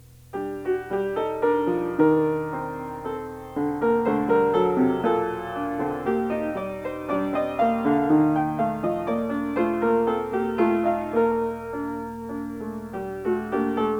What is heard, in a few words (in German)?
Barock Klavier Suite, Menuett